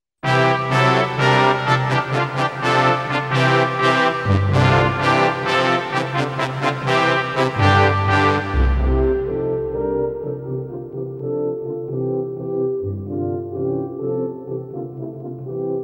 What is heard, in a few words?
Brass